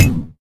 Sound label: Terrain Glass, Collision Sounds; opensim; CollisionSoundsAssetSet